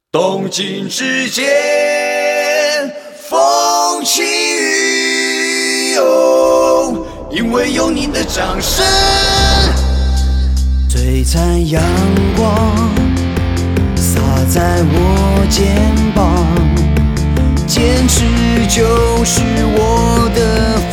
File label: music